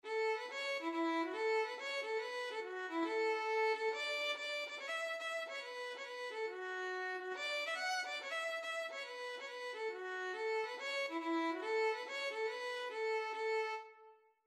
violin, Irish Trad. for Violin

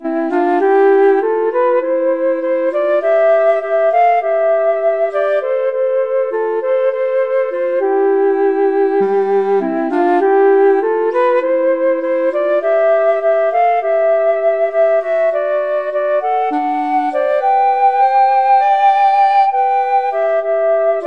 flute clarinet, flute clarinet duet

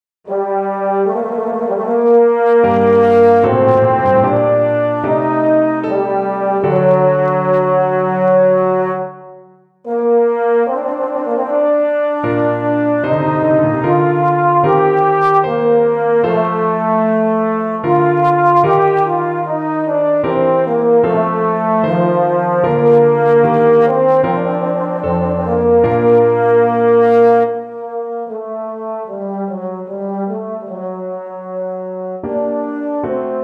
EUPH, euphonium

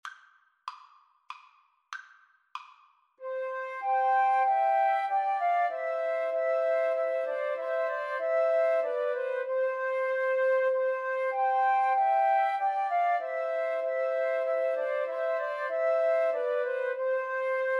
Christmas Hymn FL trio, Flute Trio

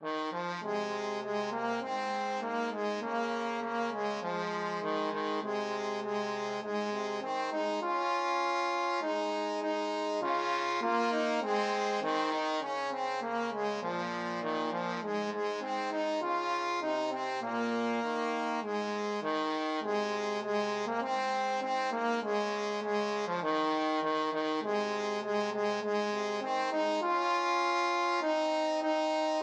loch lomond trombone duet

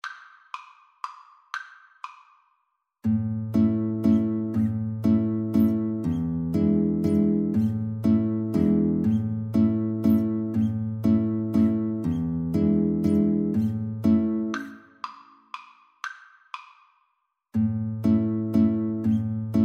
Guitar Duet; joseph kuffner op168

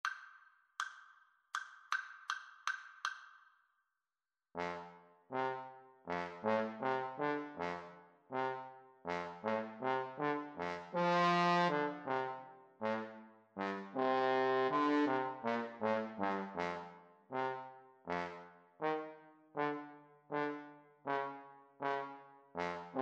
Trumpet Trombone Duet

